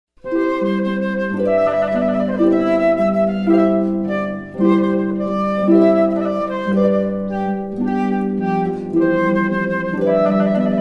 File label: trumpet voluntary; Purcell